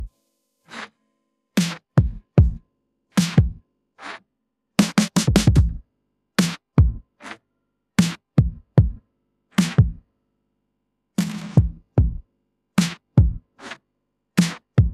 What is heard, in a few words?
ringtone hiphop